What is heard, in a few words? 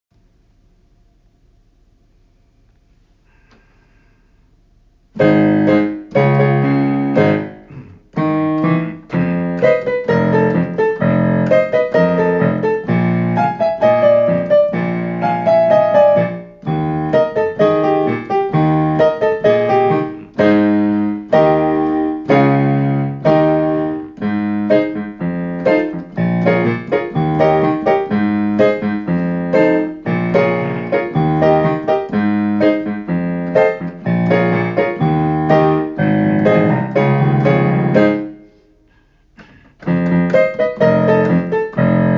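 Accompaniment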